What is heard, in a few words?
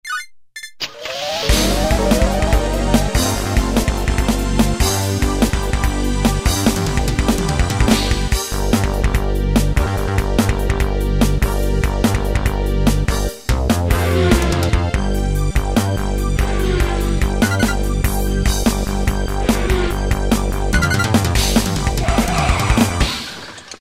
Game Pop; Preset Styles